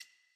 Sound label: ui generic hover